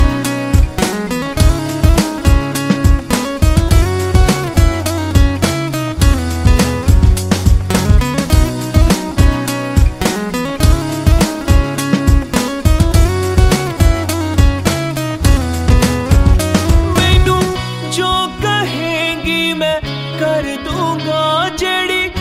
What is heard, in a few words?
new hindi music ringtone, bollywood ringtones